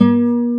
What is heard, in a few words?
GTR A#3, Guitar